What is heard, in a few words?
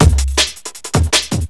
break